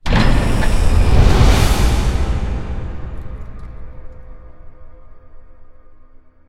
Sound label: freespin Enter; fairymirror